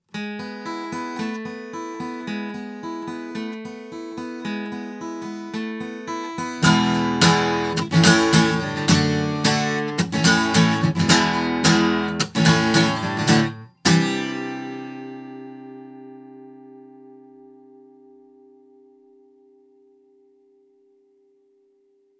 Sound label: Heil Sound PR 20 UT guitar